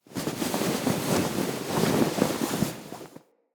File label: Tent Cloth Rustle, Interior; Soul Plane